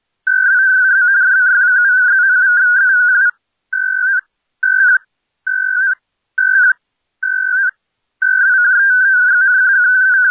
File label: V4Chat ARQ test message